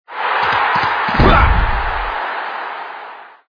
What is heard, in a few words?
UI man kick, football; Brazil